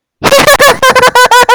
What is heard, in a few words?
goofy laugh